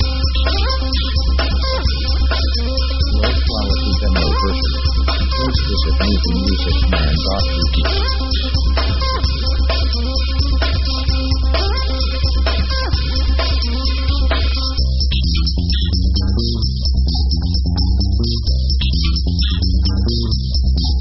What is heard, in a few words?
action break synth metal; synth themes